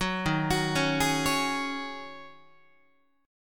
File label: D sharp Minor 11th D sharp Guitar, chord; arpeggiated; JGuitar